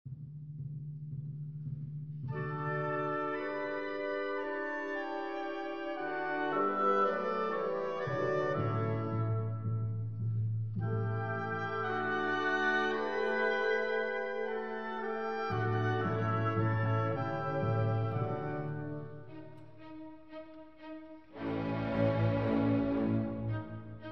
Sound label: Violin Concerto in D Major, Op. 61 I. Allegro ma non troppo